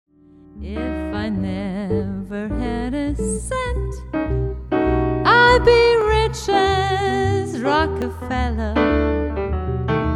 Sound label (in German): Probe Session Jazz; Audio-Ausschnitt einer Probe/Session mit "JamKazam"; Eltern-Kind-Gruppen (Klangwiese)